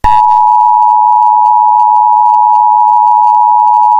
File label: spin